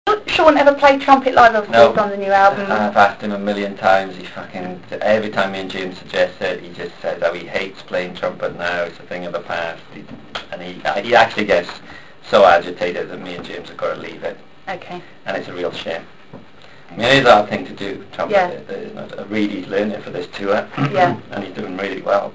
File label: trumpet